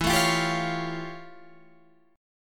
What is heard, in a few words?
F Augmented Major 9th E